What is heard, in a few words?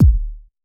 kick; Electro; alternative